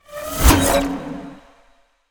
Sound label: Ice Spell Utility Levitation; Utility Spells; Arctic Aura